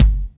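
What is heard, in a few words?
bass drum, Roland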